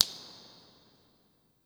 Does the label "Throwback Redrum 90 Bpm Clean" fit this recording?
No